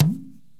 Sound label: INDIAN PERC